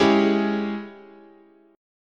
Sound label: Ab Major 7th Suspended 4th Sharp 5th, guitar chord